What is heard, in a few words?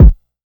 Rack Kick; Kicks; SIZ EVOL